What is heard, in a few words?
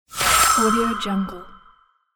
cash win